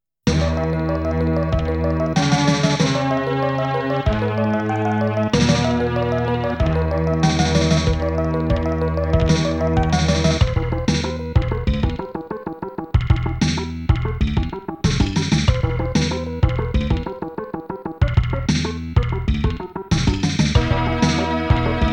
Quiet shrine; Estpolis Denki 2; Lufia 2 Rise of the Sinistrals

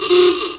Beep

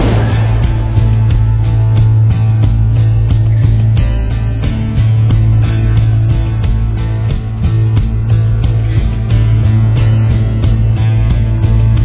Tension Loop; Empty World

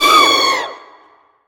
Raptor attack

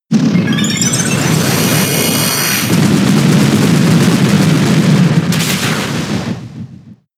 Explosion; pokemon sfx gen 7 attack moves sumo usum

